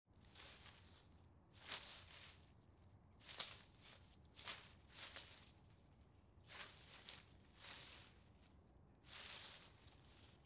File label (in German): raschelndes laub; geraeusche digital